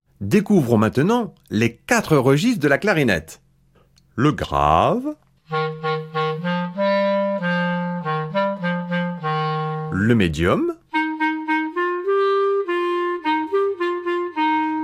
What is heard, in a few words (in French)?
clarinnnete; Latcho drom; Turquie